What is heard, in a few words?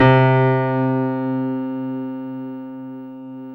PIA MICRS, MICRO-PIANO, Roland